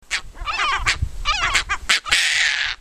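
Hydroprogne caspia worrying, background L mongolicus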